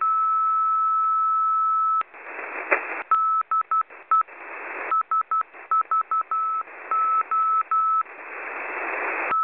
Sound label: morse